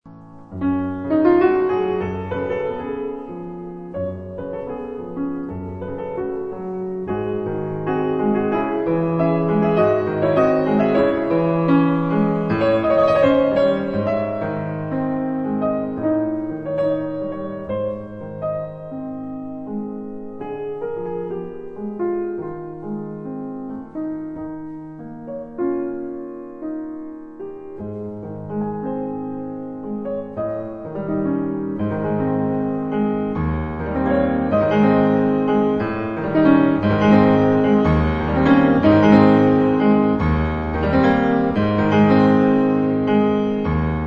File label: Pianist Nocturne In C-Sharp Minor